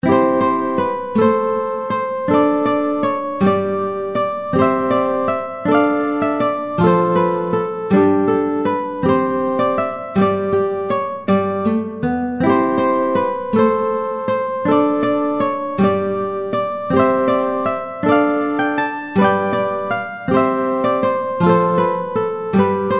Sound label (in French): guitare solo